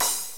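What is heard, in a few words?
cymbal; Multimedia Toolkit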